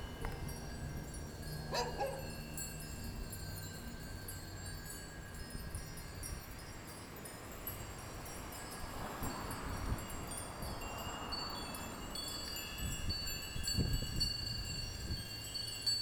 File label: Temple chimes in Thai breeze